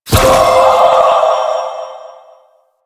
Spirit Primary Fire F; Warframe; Grineer Thumper Bot; Sentient Spirits

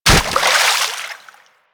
Bird Of Prey Bodyfall Water; Warframe; Hunting Critters

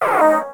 Wyrm Idle, Vocals; Pets

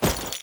Generic Footstep; Warframe; Warframes; Temple

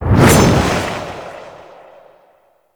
Hover Attack; Enemies; Deco Hover Attack Base; Warframe; Warframes; Pax Duviricus

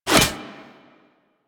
Fishing Spear Line Retract Catch; Warframe; Weapons; Eidolon Fishing Spears

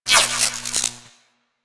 Mag Spark; Archwing Intro Cinematic

